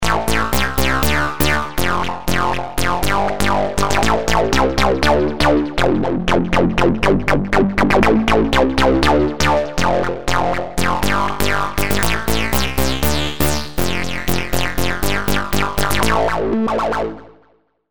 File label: Rubber Bass